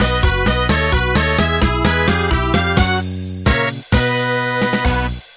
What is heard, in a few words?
gameover midi; Wacky Wheels